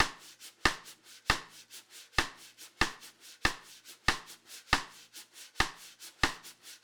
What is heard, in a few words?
Drums, Jazz